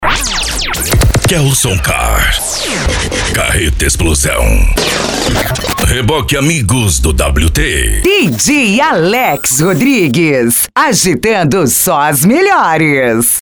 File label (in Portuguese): sertanejo e lambadao